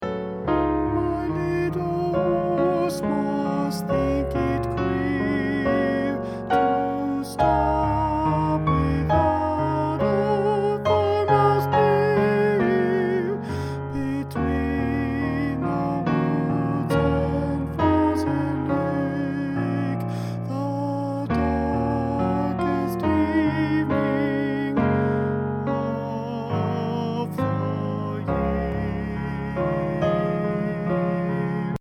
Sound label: alto